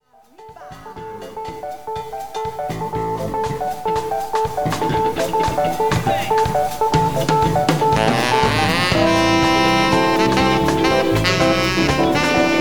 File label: ritmo solo